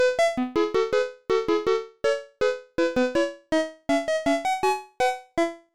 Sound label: Jingle BGM CLASSIC FROG DOCK; frogger helmet chaos nintendo ds